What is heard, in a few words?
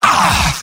demoman negative vocalization; tf2; garrysmod; mvm